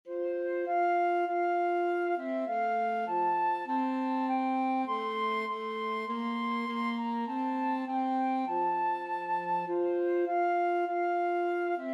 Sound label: flute saxophone duet